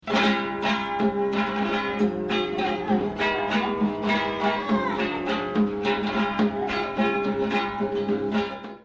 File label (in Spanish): guitarra